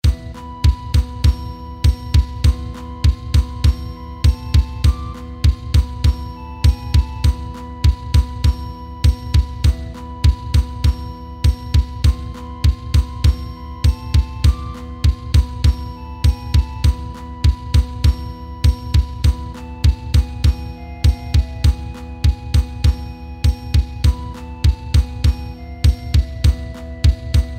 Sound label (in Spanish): PANDERO